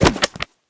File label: click collect cancel